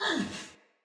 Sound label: Pain, Barks Battle Soldier; Sinecure Soldier